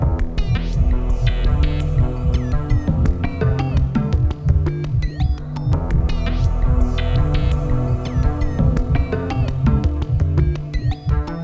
calling loop heartbeat; Rosegarden

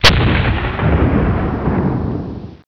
BANG

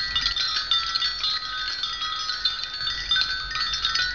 TINKLE; Zoemtonen hisses pingen woesjes tikjes

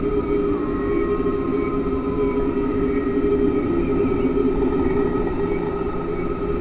jungle mix loop

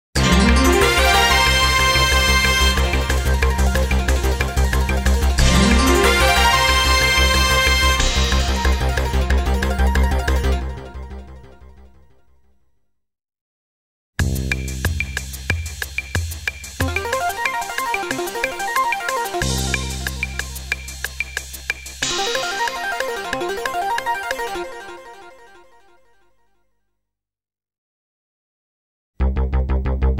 Splash Cut; Sounders, Bumpers, CUES & IDENTS; DennisMusicLibrary

